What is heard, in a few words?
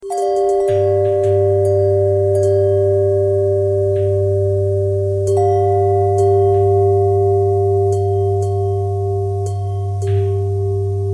bell bend twist, bells